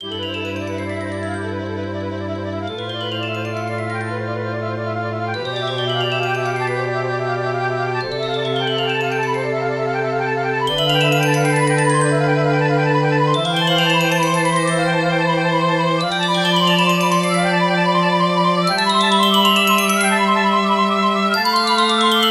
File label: Carillon Scales; Transformation Of The Root Lock